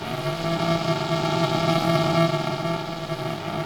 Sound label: Bio Loop